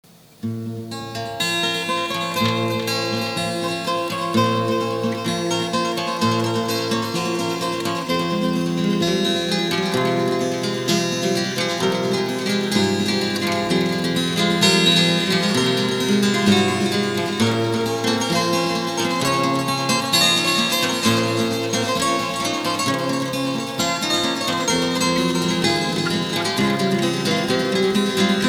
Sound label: instrumental